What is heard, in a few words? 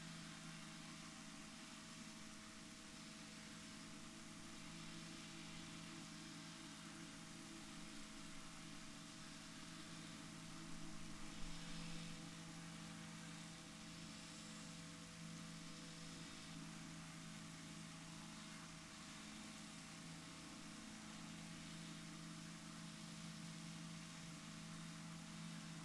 rorquals negatif, Balaenoptera Physalus Chile